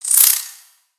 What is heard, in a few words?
Spider Attack Ranged; creatures mechanical; Lego